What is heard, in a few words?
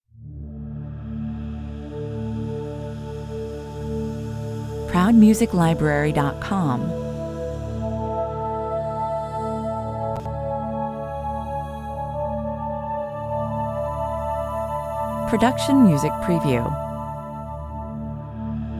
so much more i want to say (pads only) 128 bpm